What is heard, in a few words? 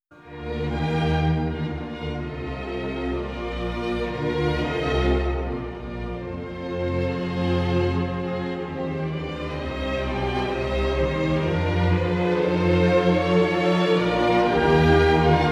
Symphony No. 1 first movement; Spring (Schumann); Legend of Galactic Heroes; Galactic Empire SIDE